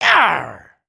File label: Get Hit Oneshot; creatures organic